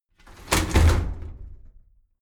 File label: shower slide door inside close heavy halfway